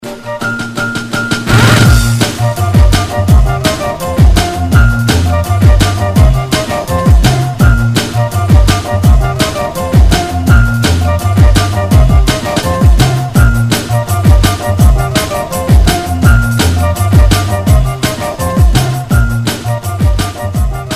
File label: drum n bossa radio mix